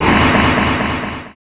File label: ROCK